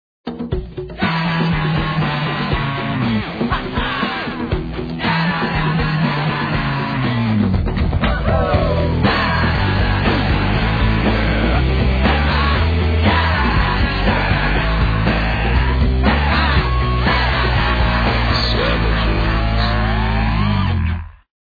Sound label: vocal